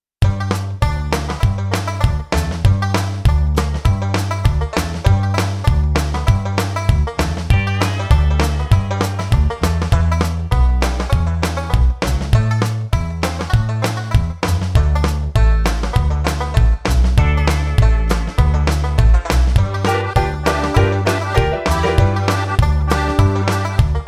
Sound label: Country Honky Tonk